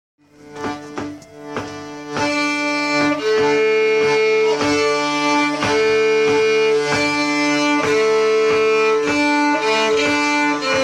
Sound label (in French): 2eme voix violon; ajde jano